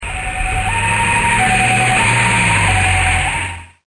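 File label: ambulance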